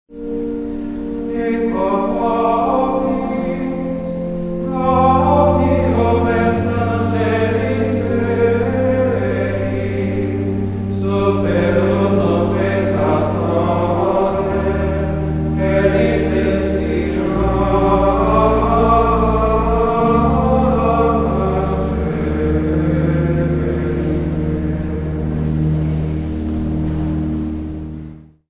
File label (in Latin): dico vobis gaudium, gregoriano; Misa Propio; S Corazon